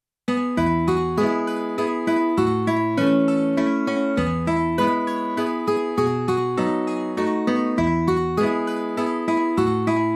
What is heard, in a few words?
midi